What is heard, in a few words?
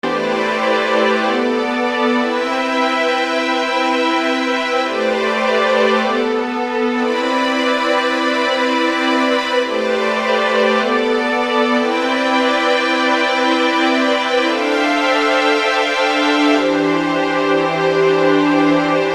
String; Sonic Foundry (Sony Creative Software); Infinite Soul VOL-1